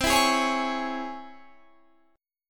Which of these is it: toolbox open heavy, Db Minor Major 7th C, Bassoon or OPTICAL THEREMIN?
Db Minor Major 7th C